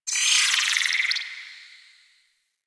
Landscape Glowing Tree Insect; ambience; props; one offs; Warframe; Venus Open World

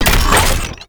Mech Crounch Stop; Nechro

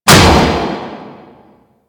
Settlement Piston Close; Warframe; Ambience; Grineer Settlement

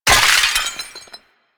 Loot Crate Burst; Ambience; Entrati; Warframe; Infested Eris

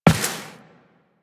Step Default; Warframe; Tenno

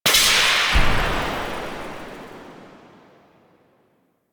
SFX Disconnect; Warframe; Orbiter Nursery

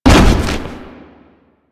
Spin Attack End; Warframe; Veilbreaker; Sprag

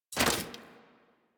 Rifle Mech Release; Weapons; Warframe; Thanotech

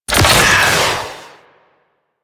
Space Fighter Dredger Weapon Fire; Rail Jack; Enemy Ships; Grineer; Agent

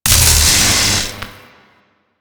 Orb Zap; Weapons; Warframe; Tenno; Grimoire

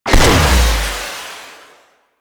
Missile Launcher Fire A; Weapons; Warframe; Railjack

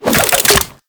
Rifle Reload; Grn Kuva Lich Rifle

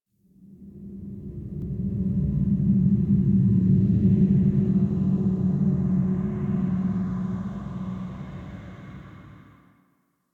Exterior Eerie; Warframe; Duviri

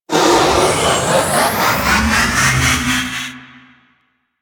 Baby Dragon Split Shot Charge; Enemies; Warframe; Duviri; Void Dragon

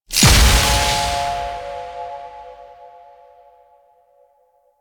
Protective Shell Cast; Warframe; Citrine

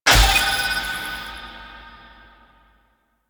Conservation Alerted; Warframe; Duviri

